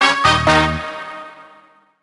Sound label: Purchase Item; penguins of madagascar the 2010 nds